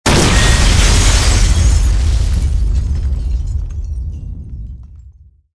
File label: explosion